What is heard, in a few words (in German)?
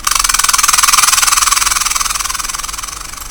pfurz